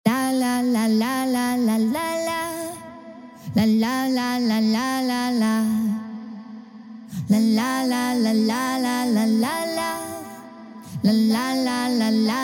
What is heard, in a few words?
vocal adlib la la la stack Am; Wax LTD Presents Vocal Pack by VOX